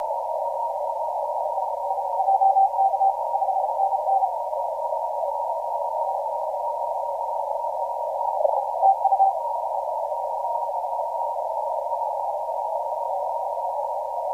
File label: 12m; FJ